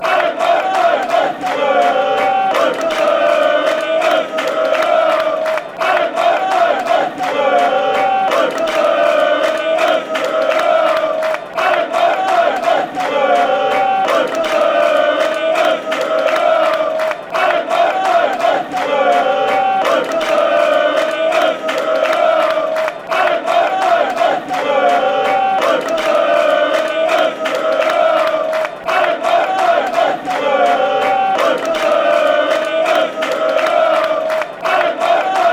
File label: ben ben benfica, fan chants